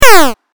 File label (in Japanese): 8bit ショット